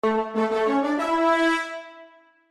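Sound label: level up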